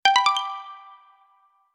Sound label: Arpeggio Ringtone; ringtones